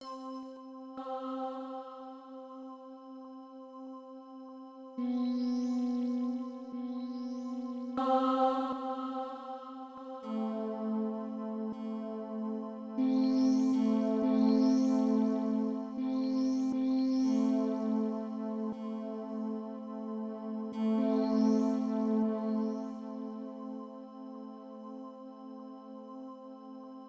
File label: AMBI DUSK; croc